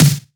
Claps & Snares

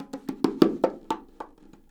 percussion loop 125, bongoloops; house; PS-28 Vocal House Samples

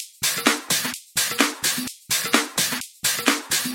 Minimal Loops

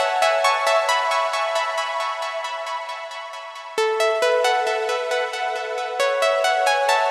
135BPM Pluck WET, F#minor; Melody & Synth

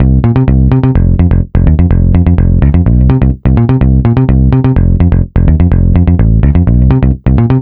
Root C 126BPM; Vengeance Samples; VEH3 Special Sounds

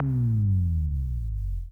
140BPM Sub FX; Sound FX; G minor; Vengeance Samples; VTS1 Devastating Truth Kit

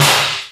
Snare; Vengeance Samples

